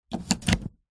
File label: household mains plug australia plug into wall socket; zapsplat